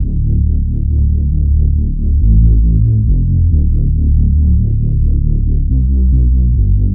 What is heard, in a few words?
Bpm138 Tub Dub Bass Loop Dm, Dubstep; Prime Loops; Dubstep Illusions